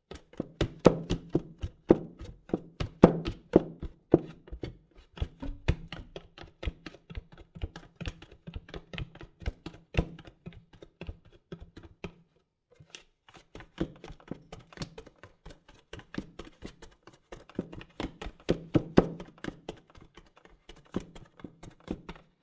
Normal Wood Barefeet Running; Todd Blankenship

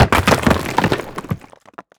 debris falling rock urban; Bluezone Corporation

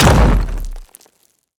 invader debris impact; Cinematic Impact; Bluezone Corporation

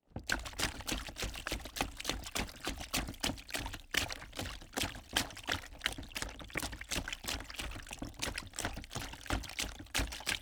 Puddle Running, footsteps; Todd Blankenship

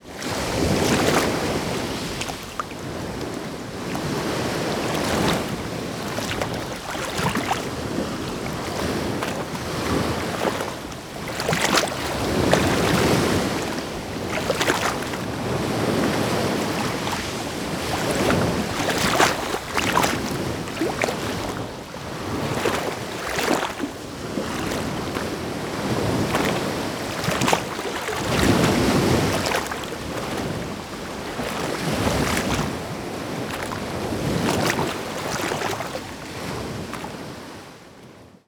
ambience ocean waves; Bluezone Corporation

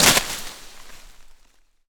creature wood texture leaves impact; Bluezone Corporation

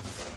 car crush, car; simpra

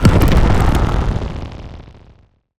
biohazard creature organic texture; Bluezone Corporation

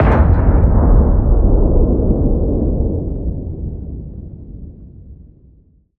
explosion outdoors large distant; Bluezone Corporation

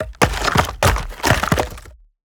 forest wood log handling; Bluezone Corporation

